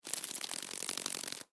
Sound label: Insect Bug Beetle, Insect Bug Beetle Wings Shake Buzz; Animal; Insects; Blastwave